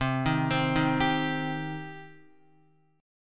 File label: C Minor C down Guitar; arpeggiated; JGuitar